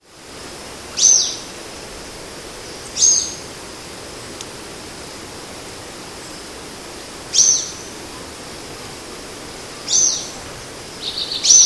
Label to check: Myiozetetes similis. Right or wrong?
Right